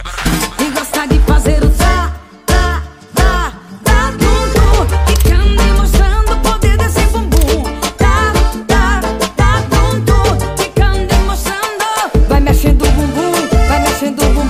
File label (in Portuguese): eletro funk; frequency mix